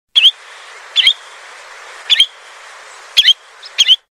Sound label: Spotted Redshank; آبچلیک خالدار